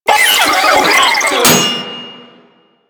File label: Volatile Runner Explosion Warning; Enemies; Abilities; Proto Infested Volatile Runner; Warframe